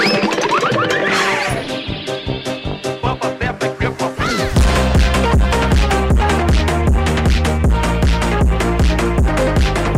phonk piggies

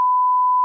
censor beep

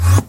ender teleport

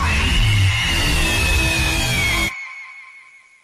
void scream

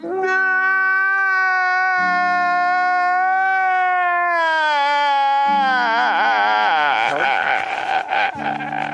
man crying really hard

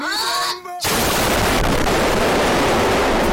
hawk tuaa

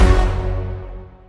2nd kill sound; valorant

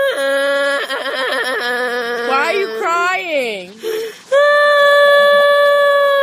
best crying

